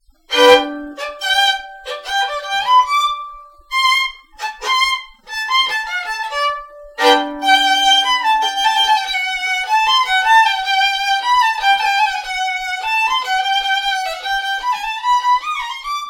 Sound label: Birds eye maple, violins